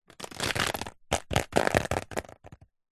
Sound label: water drips short sudden onto umbrella; nature